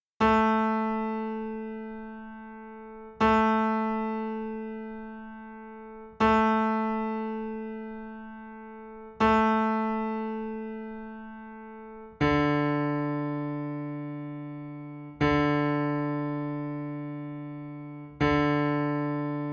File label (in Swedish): Stamtoner cello